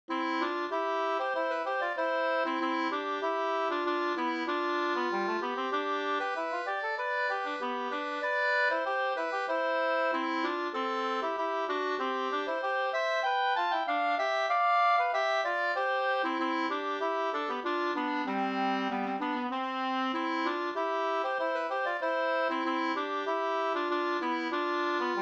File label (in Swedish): ganglat, 2st; Leksands kompanis bevaringsmarsch